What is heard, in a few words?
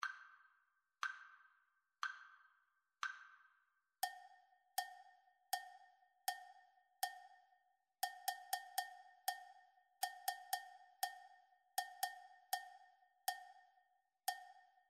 rhythmic dictation